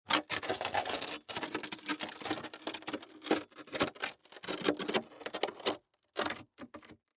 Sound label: window scraping